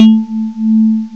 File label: VIBES, MALLETS; M8; CMI Factory